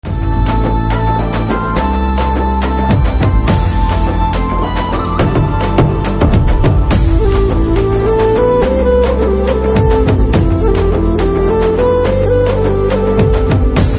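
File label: Title background music Telugu Ringtone; Venky Mama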